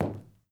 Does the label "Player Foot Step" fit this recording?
Yes